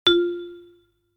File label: ding low